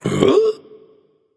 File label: surprised, voice; Smite; ymir skin14